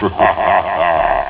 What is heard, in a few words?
hahah; Amiga Soundtracker Sample Packs